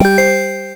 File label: Text feedback